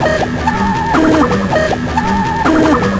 FX, Futurist Drum & Bass

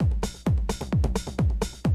130, Classic DrumLoops

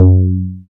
BAS FM DX-7

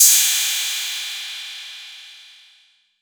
PERC 808

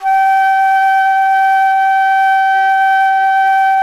traverse G5; SoundBytes - Early Patches

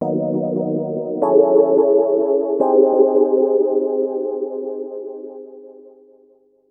D&B Keys Riff; neuro

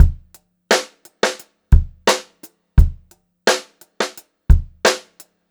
87 STR BEAT, Funk-Groove Drumloops; USB Soundscan vol.38; AKAI

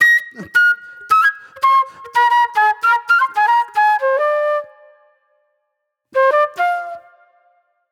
Raw Funk Flute

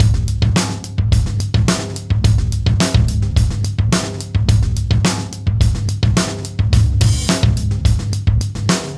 107 live breaks

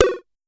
Pollard Syndrum

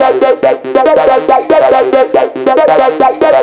NRG Lead, trance

